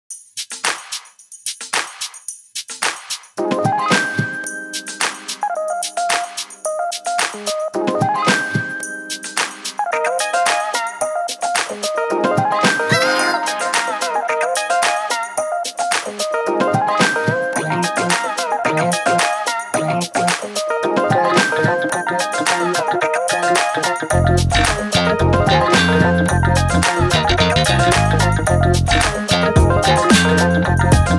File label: Glitch Hop